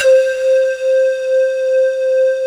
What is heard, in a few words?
MALLET C5; MALLETTE